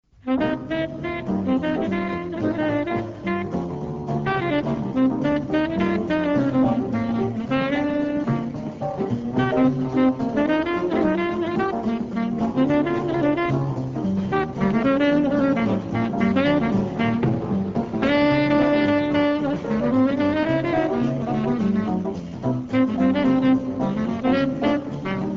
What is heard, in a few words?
improvisation